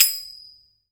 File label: ZIL, FINGER CYMBAL